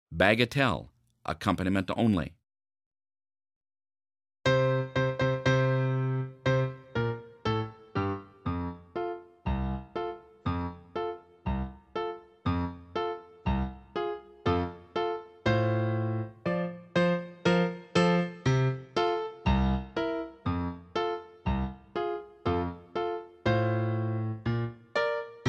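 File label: Horn Accompaniment only; Bagatelle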